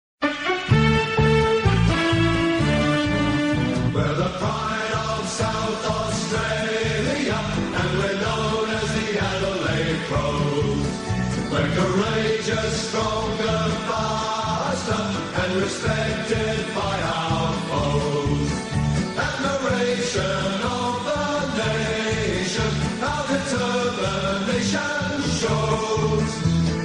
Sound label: ringtone; adelaide crows